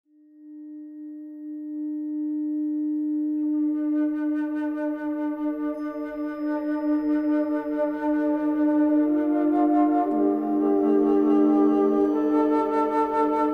285 Hz

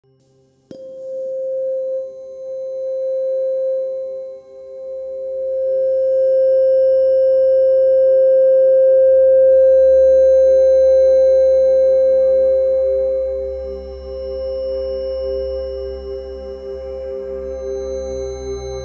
528 Hz Solfeggio Meditation

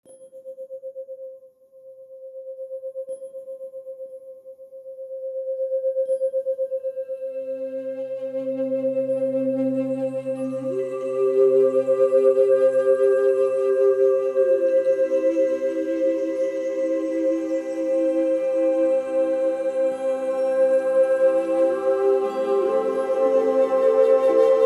Solfeggio Love Frequency 528 Hz

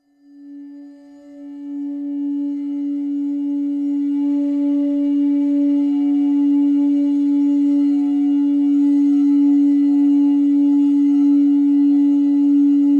285 Hz

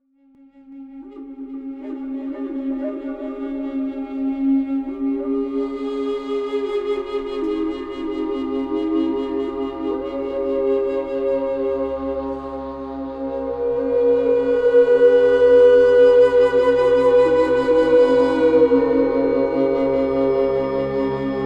Ritual 13610 Hz

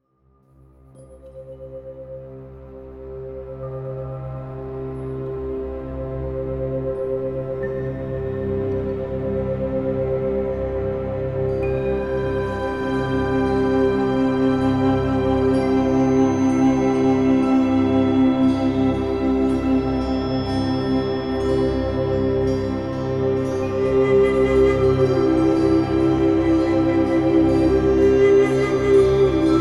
528 Hz

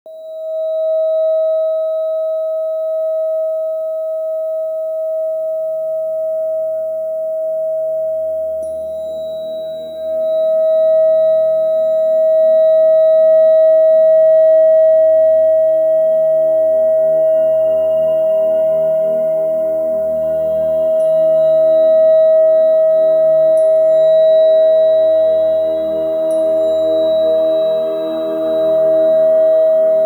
639 Hz

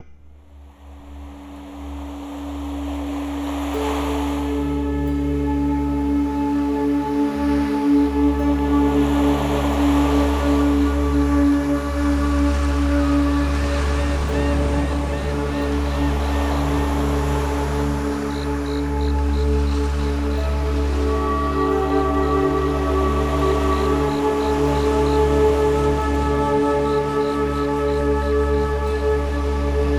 Tropical Beach 285 Hz

